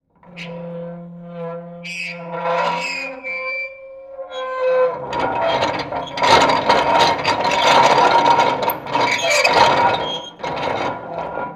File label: Rusty Wheel